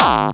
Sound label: BD Distortion, Bassdrums; Vengeance Samples